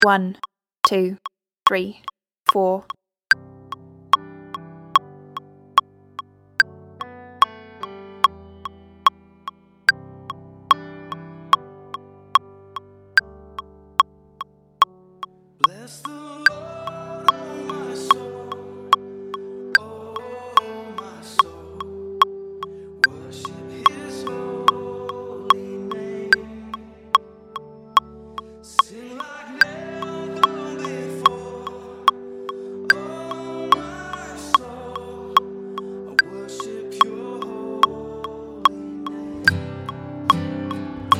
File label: keys track